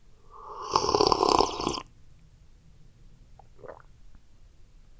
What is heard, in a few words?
drinking a hot one; eating